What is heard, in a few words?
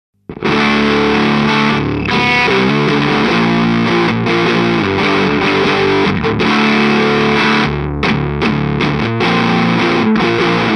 Harmonic 1 gain max; Korg Nu Tekt HD S